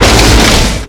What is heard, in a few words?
medium crash, vehicles